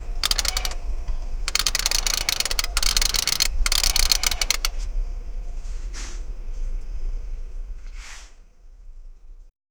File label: toy car moving